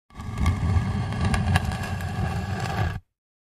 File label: 2x4 Dragged On Cement